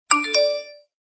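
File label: pop new message text notification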